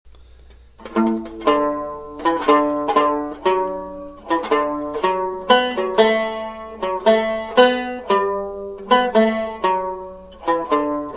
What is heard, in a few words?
waltz; amelias